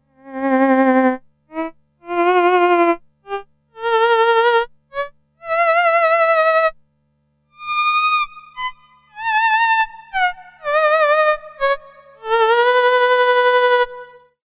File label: theremin